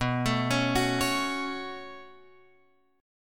B 7th Suspended 2nd, Guitar, Open F; B7sus2; arpeggiated; JGuitar

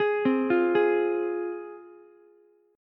F Minor F down Ukulele; arpeggiated; JGuitar

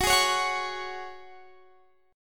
F sharp Suspended 2nd, Guitar, Standard; strummed; capo 9; chordshape

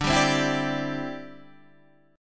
D 9th Suspended 4th D down Guitar Open D

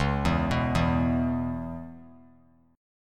Db Minor 7th Db down, chord; arpeggiated; JGuitar

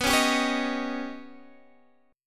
D sharp 7th B; D#7/B; strummed; chord shape

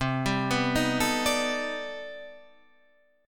C Minor Major 13th C down Guitar Open D

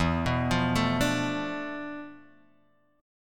D Minor 6th E, guitar chord; Dm6/E Chord {0 2 3 2 3 X} arpeggiated

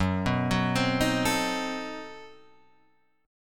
B 7th Flat 9th, guitar

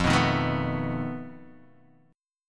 F 7th Flat 5th F down Guitar Drop D

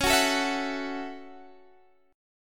A 6th C sharp

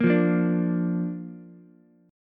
Bb Major Bb down Ukulele, chord; strummed; JGuitar

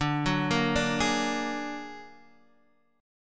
Bb Augmented 7th D

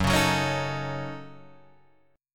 Gb Minor 6th Gb Guitar Drop D

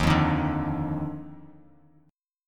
Eb Major 7th Suspended 2nd Eb Bass Standard; EbM7sus2; strummed; JGuitar

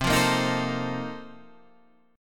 A sharp Major 7th Suspended 2nd Suspended 4th C

